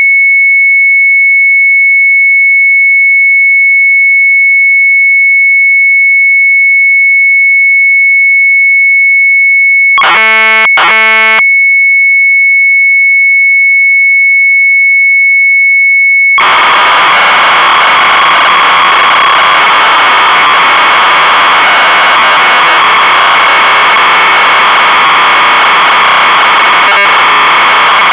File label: Sharp MZ800 Tape; SUBMONMZ700; retromelsarchive